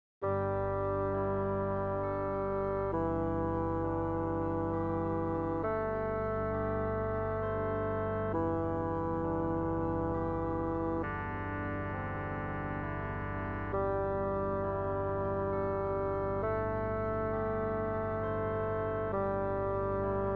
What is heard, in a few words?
orchestra